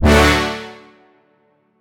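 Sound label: flomph D major; weekend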